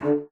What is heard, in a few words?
D3, bassoon; Xsample VOL-6; C BASSOON